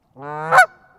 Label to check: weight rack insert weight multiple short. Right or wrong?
Wrong